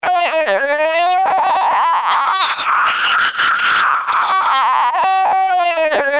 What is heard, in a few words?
SPR 1200 siren, non-speech signal